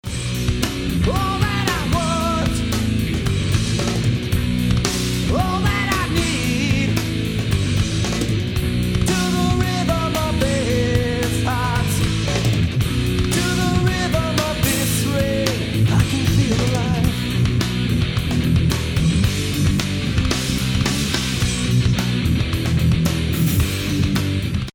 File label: rain